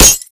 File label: bullet light ballistic solid glass close